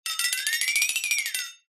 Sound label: cartoon flexatone